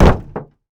footsteps land auto; player

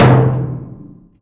impact, metal barrel; physics; props

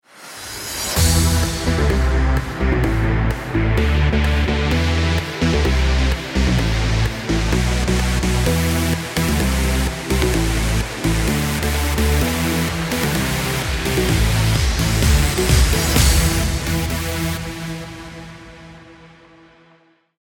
start round, music

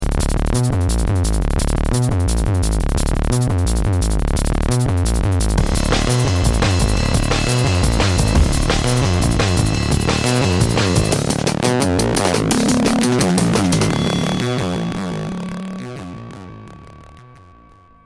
start action, music

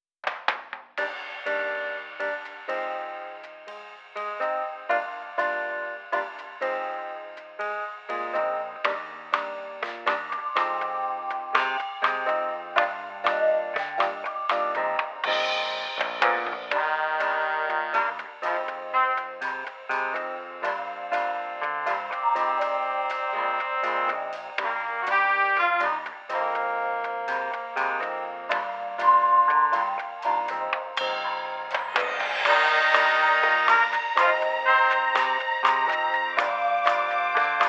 elevator muzak

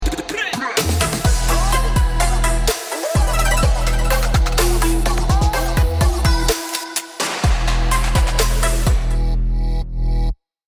round ten sec count, music

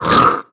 grunt soft, creatures; vox

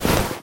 move soft; scripted sequences, a1, safehouse

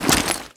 foley step, soldier; combine; soldier common

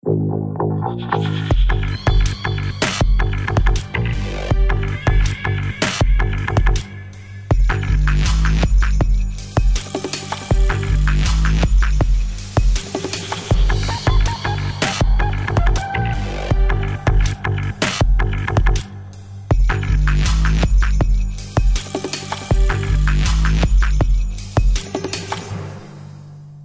start round, music

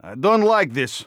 scared emote; Counter Strike; CS:GO; phoenix coop

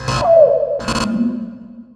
cave siren loop, ambient; Half Life 2; Episode 2; outland

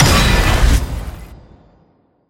hit, antlion car smash; Half Life Alyx

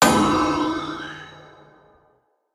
ghost impact, halloween

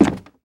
wood, footsteps

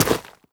step, footsteps player debris

